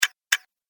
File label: Sharp Clicks Slow Double Press, click; Andrew Fleming